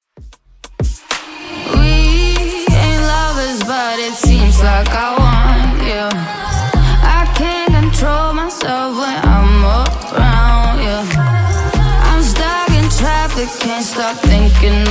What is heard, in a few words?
96bpm